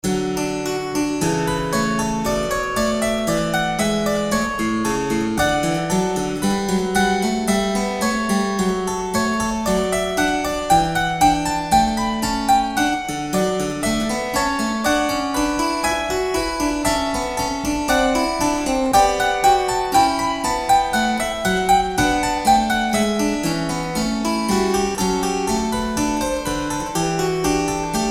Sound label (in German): Spinett; Kl. Präl. D-Dur BWV 925